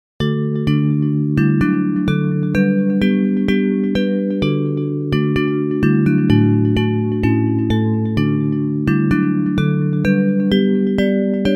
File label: Bells; Hymns